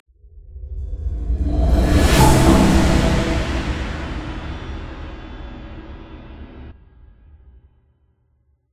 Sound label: Transition End; Everspace 2